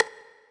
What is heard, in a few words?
Rimshot; Zero-G; Nu Disco